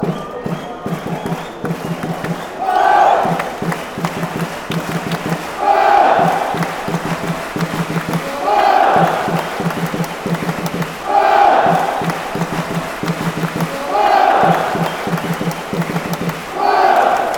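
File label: drums, football fan chants; motherwell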